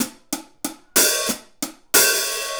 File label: Hi Hat Pattern